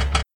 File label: reset knockdowns, pinball